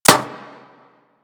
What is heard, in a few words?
Bullet Impact Metal; Warframe; Weapons